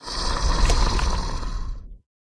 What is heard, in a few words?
die